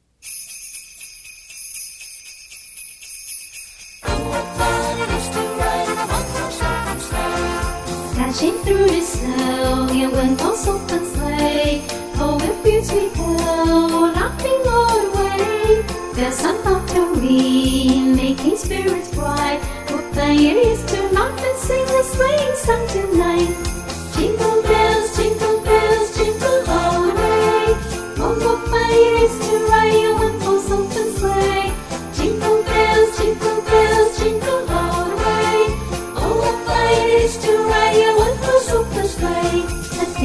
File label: Jingle Bells